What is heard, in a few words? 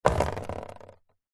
nature water drips sudden on card folder binder